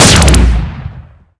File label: inner shot; zs inner